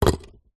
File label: concrete block set down ground; foley; zapsplat